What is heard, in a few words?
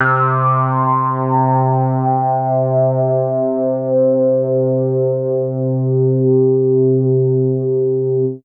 C3, BASS; Q Up Arts; Dream Experience; BASS BANK 16